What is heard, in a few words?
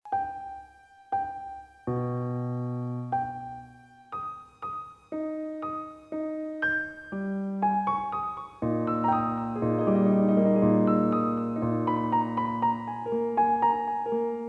Piano